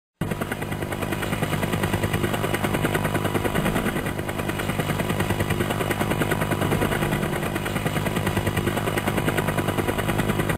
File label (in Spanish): helicoptero militar